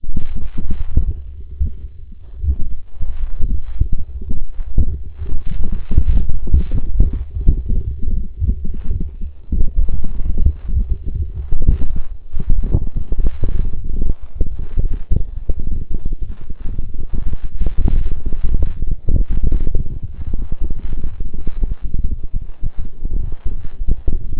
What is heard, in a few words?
blinds full denoised, light to sound